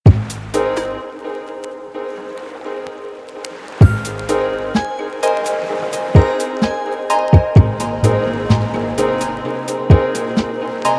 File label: Fillers Used Rock